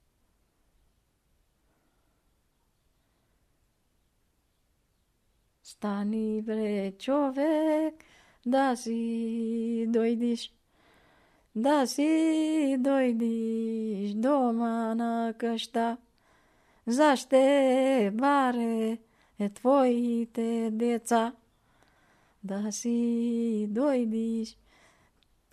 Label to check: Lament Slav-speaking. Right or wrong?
Right